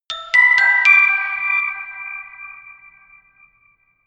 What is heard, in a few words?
notification glockenspiel creepy sequence jump